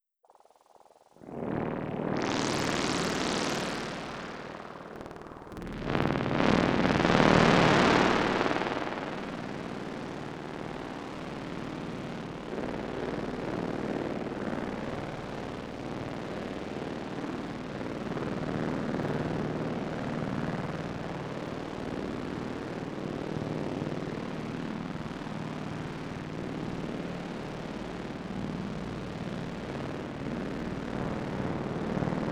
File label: Glitch